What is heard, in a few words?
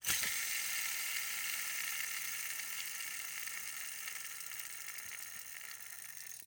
Rollerskates, old fashioned, all metal, wooden wheels, swipe, spinning, slow to stop, abrupt; Eiravaein Works; Vaeyan IV